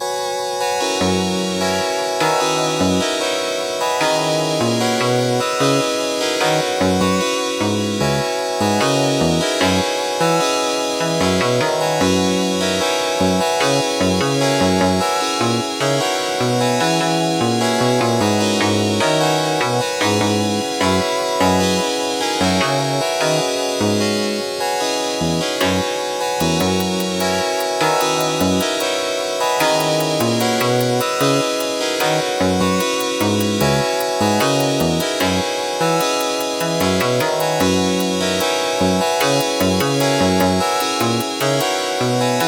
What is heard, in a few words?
continue question, Sega inspired Continue-screen, loopable VGM